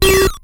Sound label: Pac pill, ATARI